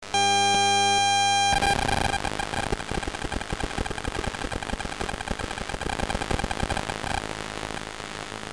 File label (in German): bluetooth max uebertragungsrate